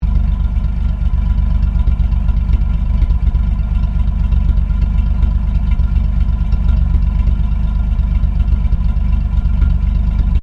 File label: CAR HOLDEN FJ IDLE EXHAUST LOOP, Classic Australian cars; 1955; Vehicles